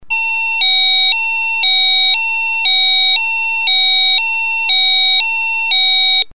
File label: Alarm